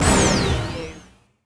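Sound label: Production Element Hit Synth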